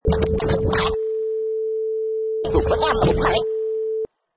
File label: Scratch